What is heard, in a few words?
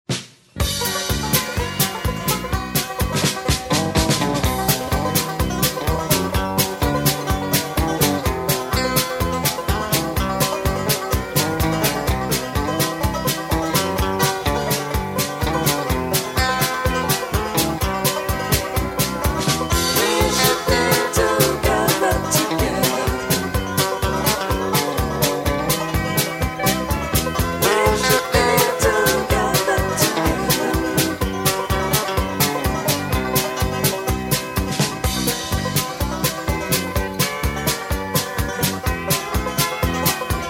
music